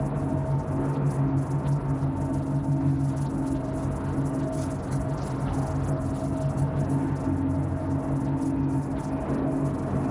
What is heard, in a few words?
neuron calculator phase 1 idle; Stellaris; machineage; superstructures